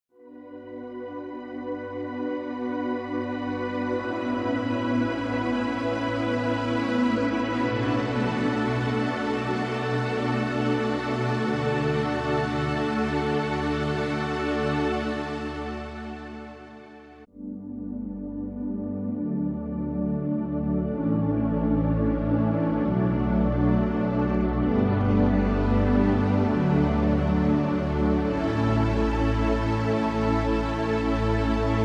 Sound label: Analog V Pad; Analog Atmospheres; pilgrimsounds